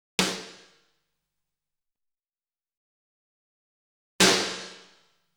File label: snare 1+2 dry; Sound Sampling Collection VOL-5; Drums & Bass Fusion Funk Effect